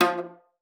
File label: F3, VIOLA PIZZ; Xsample VOL-5; 90 s Sample CDs